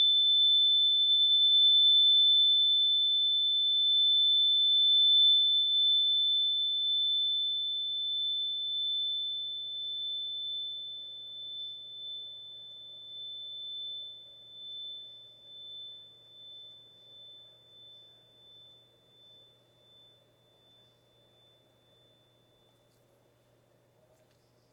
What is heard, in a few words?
chime bars